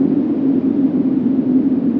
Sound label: wind